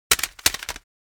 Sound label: Pile of CD and DVD cases dropping a disc